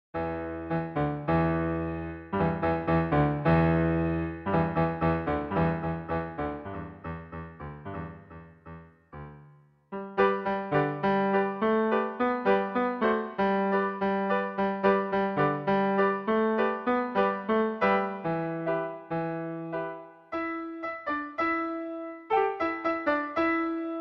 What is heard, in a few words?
Pentatonic Tune, pianoforte; libri di pianoforte per i corsi di base